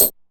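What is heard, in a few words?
Tambourine, DIGITAL KIT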